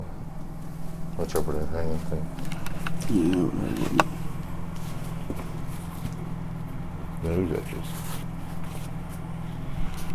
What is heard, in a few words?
Growl; evps